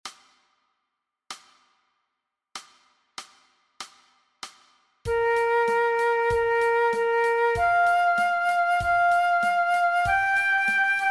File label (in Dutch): MEESPEELTRACK Fluit Alternate; Dwarsfluit aangepast; Nij Talint Orkest